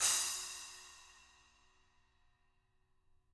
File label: SPLA HIT, SPLASH CYMS; SPLASH 10